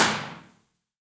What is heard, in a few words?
Sleeping Giant Tower, Impulse Response; EchoThief Impulse Response Library